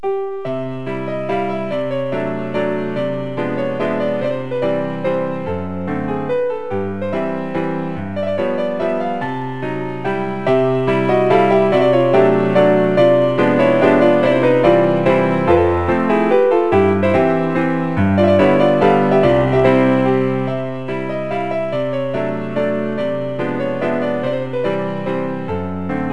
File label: mazurk